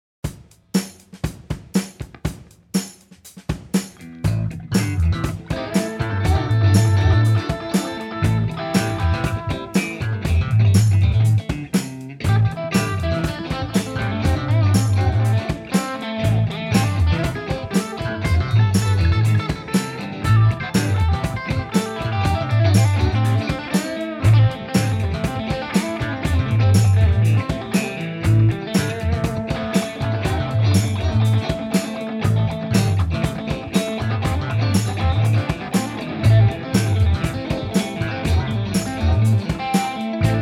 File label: Funky Snowstorm